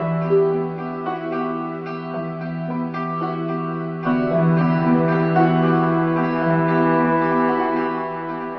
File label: piano and strings, Sad; loops